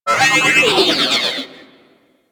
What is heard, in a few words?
robot metallic textures; Warframe; Venus Open World; Objective Rooms